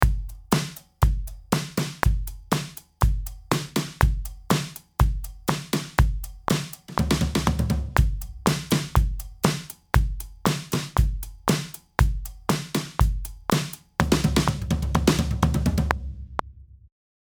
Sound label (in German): Logic ProX freier Drumbeat; Projekttempo angepasst